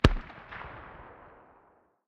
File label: hmg dshk far distance, 12.7x99mm; tacz-tweak-pack; taczmechanics